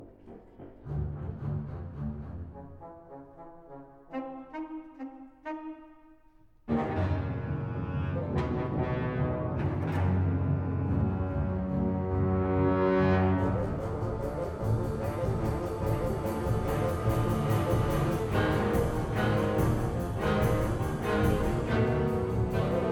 symphony, orchestra

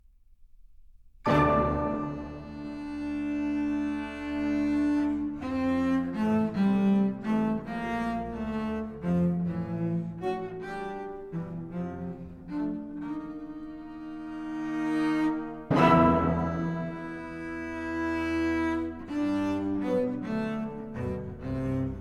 concerto for bass viol and orchestra lamento

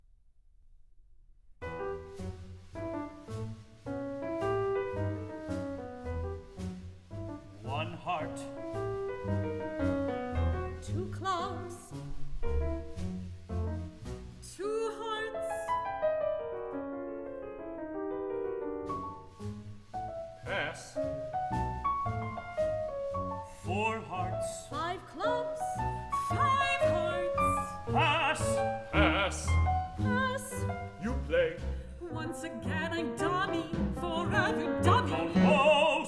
orchestra

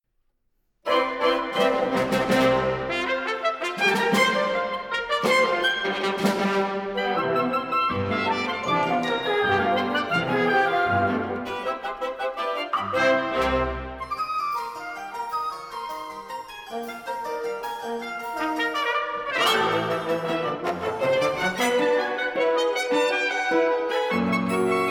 divertimento, overture, orchestra

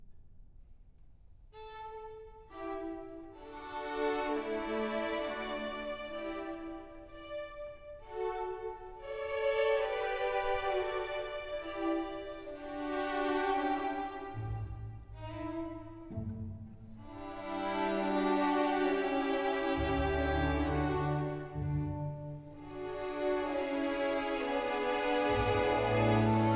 serenade in d, larghetto poco adagio, orchestra

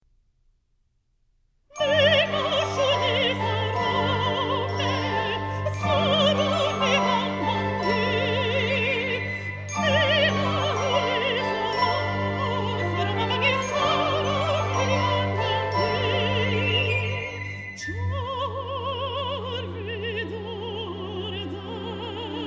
folk songs

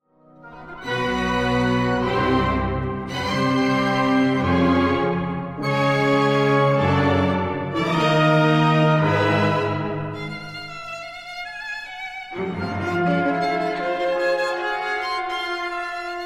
violin con mvt. i

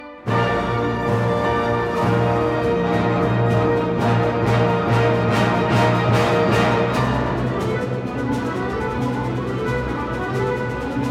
concerto for piano and orchestra III

